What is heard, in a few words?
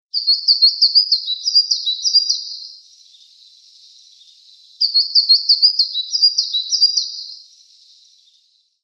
Taiga Flycatcher song